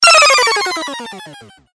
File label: Game Over Jingle; Sigi A Fart for Melusina